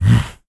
yeti attack; Crypt of the NecroDancer

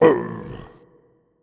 blues king hit; Crypt of the NecroDancer

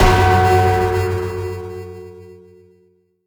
deadringer bell strike; Crypt of the NecroDancer

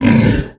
minotaur charge; Crypt of the NecroDancer

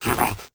mimic chase; Crypt of the NecroDancer

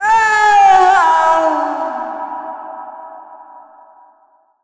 death; Crypt of the NecroDancer

